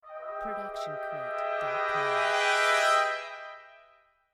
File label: brass dissonant cresc